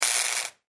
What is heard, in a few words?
Arc Welder; arcs & sparks